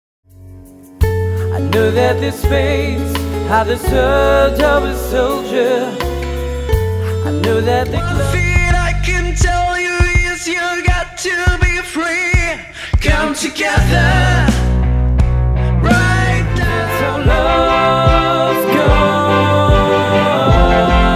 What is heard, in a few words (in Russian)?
vokal